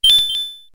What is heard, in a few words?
se coin, 8bit